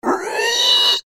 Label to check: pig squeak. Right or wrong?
Right